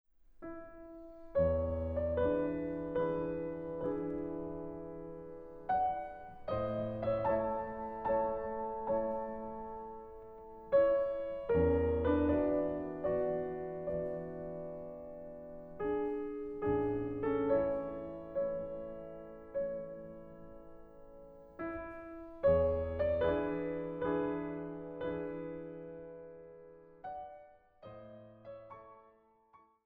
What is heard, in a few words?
Prelude in A major; chopin